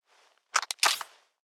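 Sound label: weapon pistol plasma jam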